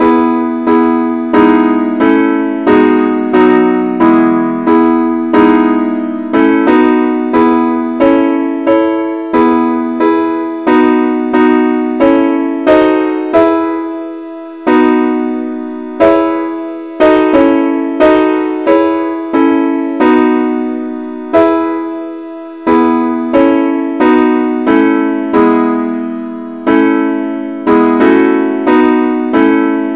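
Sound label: advanced accompaniment, key E; christe Sanctorum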